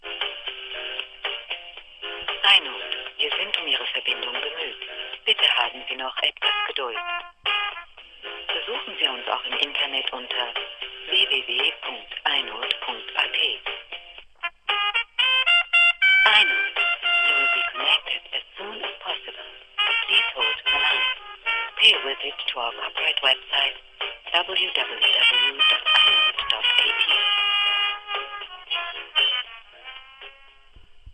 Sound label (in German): warteschleife; inode